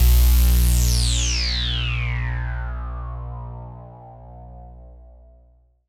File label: ATMO PADS; Best Service; XX Large